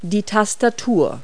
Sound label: keyboard; Multi-Lingual Talking Picture Dictionary